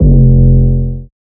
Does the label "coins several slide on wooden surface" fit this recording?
No